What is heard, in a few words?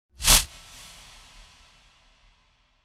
whoosh